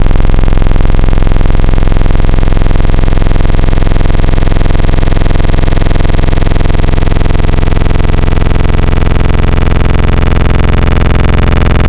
Fuzz Saw; Digital; Synthetic's Serum Collection; Echo Sound Works Core Tables

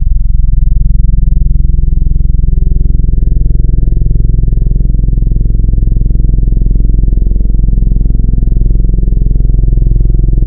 Trumpeter; Instruments; Synthetic's Serum Collection; Echo Sound Works Core Tables

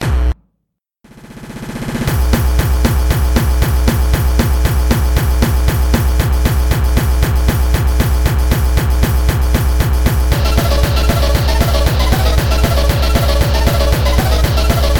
Chiptune Hardcore; Sony Ericsson Chiptune Hardcore Party Mix

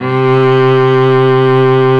Noises Cello; Production Master; Vital Future Bass 4

